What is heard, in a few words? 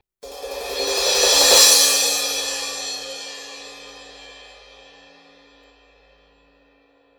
sabian 16 aax metal crash